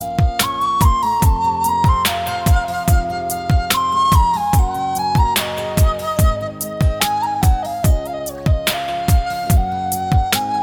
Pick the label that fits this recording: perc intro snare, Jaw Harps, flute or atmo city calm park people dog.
flute